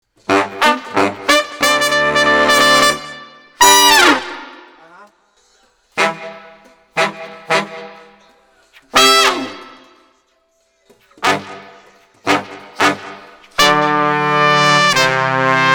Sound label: Brass